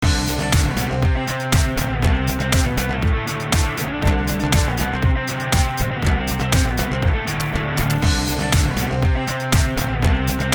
football music; Kids Games; DribbleKingsFootball

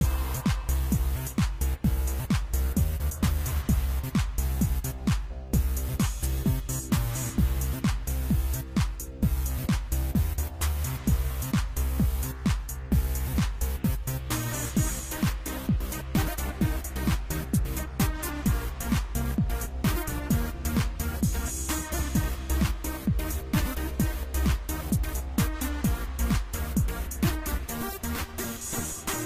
gameplay music; BoatRush; Racing Games

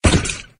crash; Karting

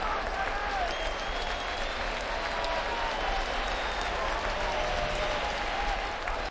crowd loop; Sports Games; BaseballClassic

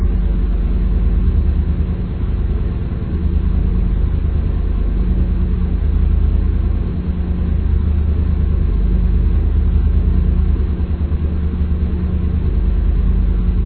v8 idle; MaseratiGranturismo; Racing Games

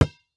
ball shits; FootgolfEvolution

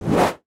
woosh; LightRays; Action Games

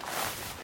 fallen im grass; Kids Games; DribbleKingsFootball

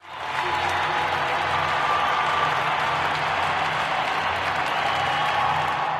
cheering; FoosBall

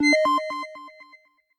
checkpoint on; Karting

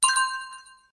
coin sound; BoatRush; Racing Games

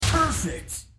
level won; CasinoCardsMemory

